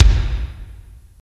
long room reverb bass drum G sharp key, bass drum one shot tuned to the G# note